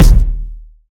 Long Tail Reverb Kickdrum D# Key, Royality free kickdrum tuned to the D# note